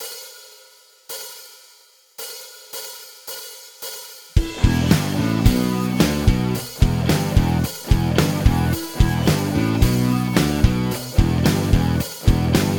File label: Riff Band, guitar